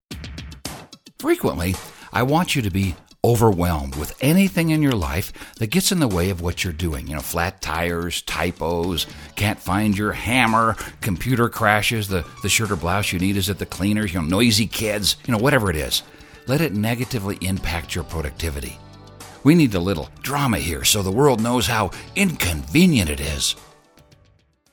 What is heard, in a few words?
music